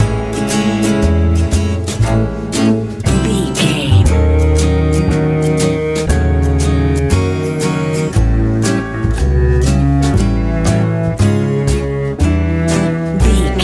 easy pop

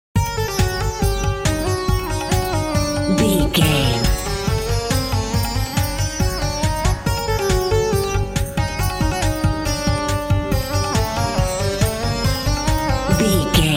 egyptian video game level loop

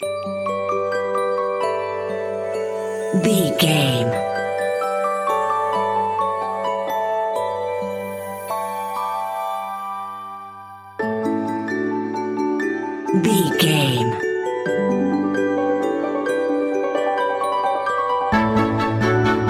whimsical utopia

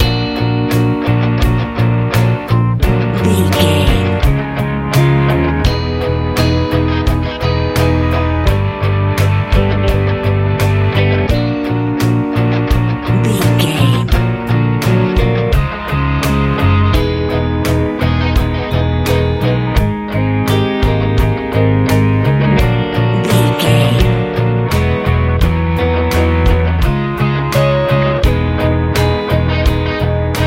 blooming warm swirl big love punk garage rock

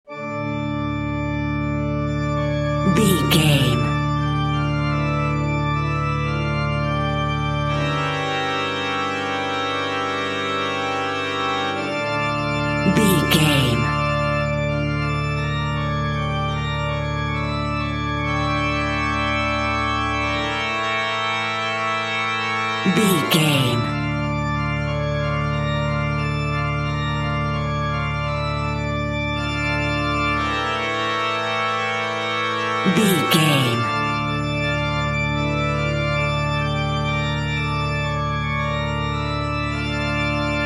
phantom organist

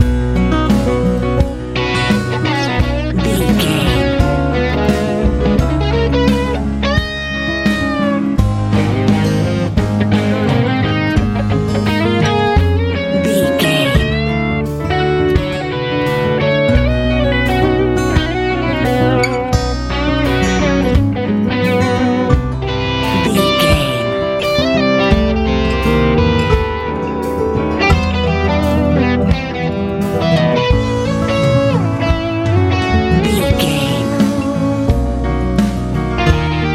easy pop

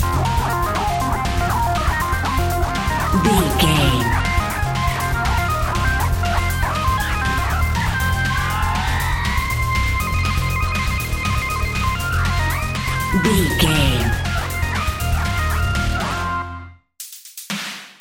intense challenge video game loop

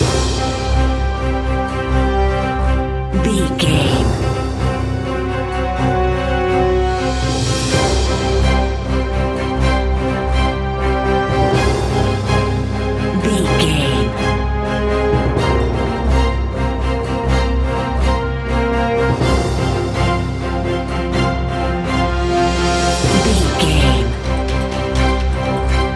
let battle commence loop

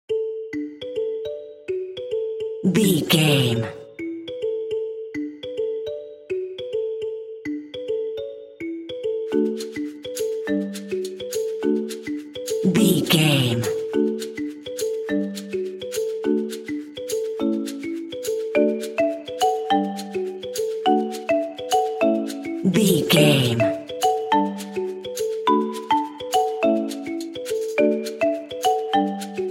african sun

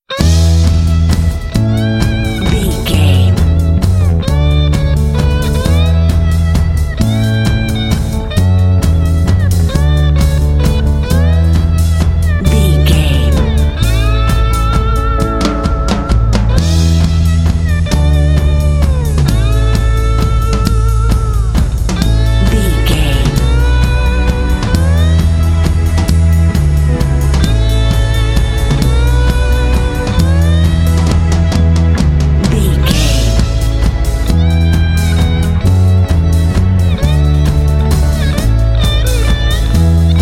rusty slide stomp twang light mix

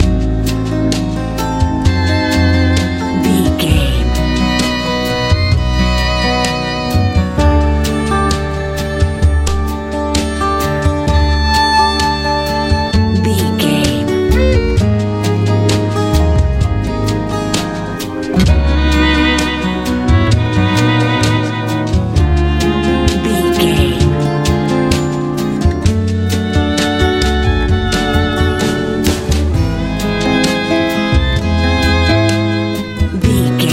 easy listening

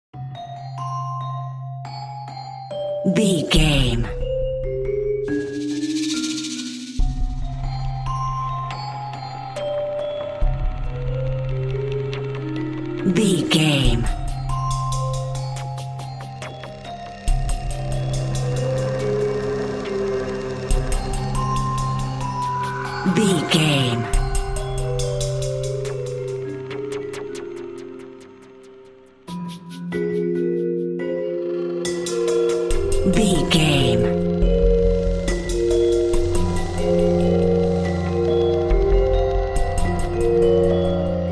mysterious shadowy figure

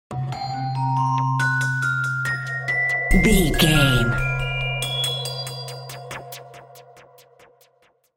mysterious shadowy figure stinger

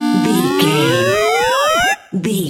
retro gaming powerup

